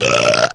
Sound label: burp short